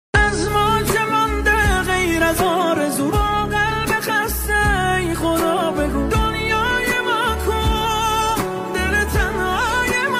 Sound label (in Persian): music, آهنگ کوتاه غمگین ایرانی